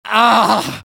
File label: engineer pain severe; svencoop addon; tfc; player